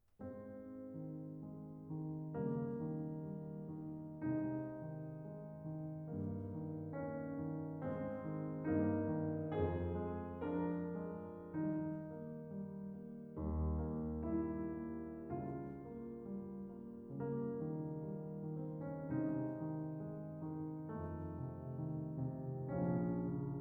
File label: Bluethner Model One; Beethoven Pathetique Mvt 2